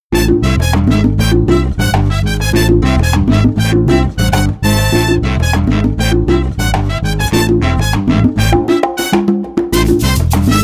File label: Spicy Salsa; Latin Samples